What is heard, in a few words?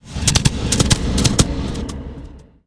Ethereal Mount Play Underlay